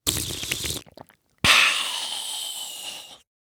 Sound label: Horror Scary Monster Suck Blood